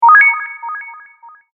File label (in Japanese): 決定